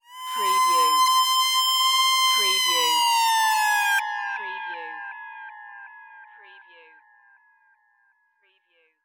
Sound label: Synth Scream